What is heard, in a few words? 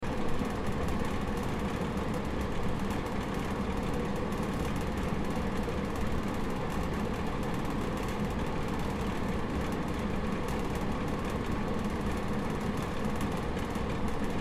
Air Conditioner Rattle Run Loop; Air Conditioners; Household; Blastwave